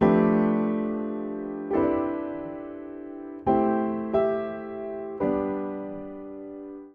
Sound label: Progression VI-III-i-VII-VI-v-IV-VII9_III A Minor 138 bpm, Upright Piano; MIDI Clips; Look Forward; Ableton; Factory Packs; Spitfire Audio